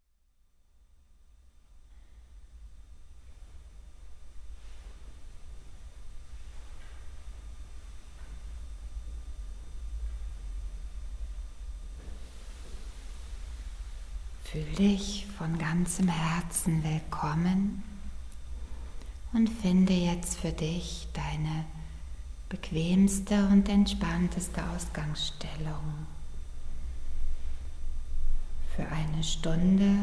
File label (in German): Meditation